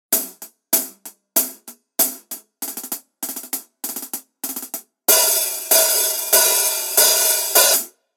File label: Custom Hihat 14 Heavy